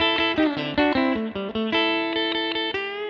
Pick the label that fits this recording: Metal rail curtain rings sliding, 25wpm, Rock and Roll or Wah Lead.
Rock and Roll